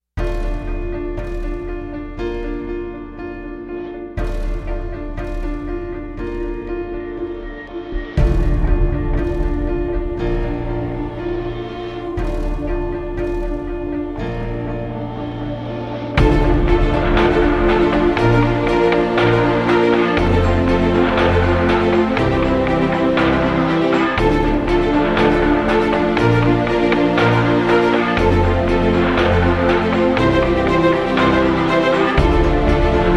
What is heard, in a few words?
music menu